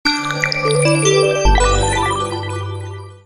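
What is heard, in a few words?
power on; KU3800 Bubble Pop; KTF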